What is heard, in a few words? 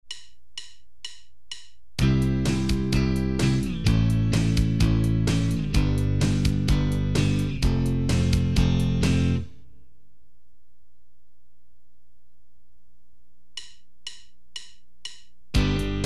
Progressions using E-based barre chords, guitar